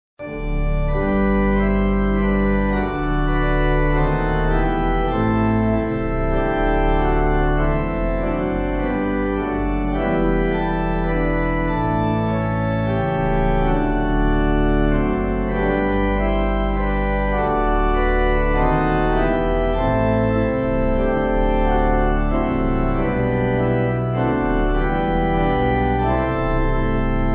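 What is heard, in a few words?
Pipe, Pipe Organ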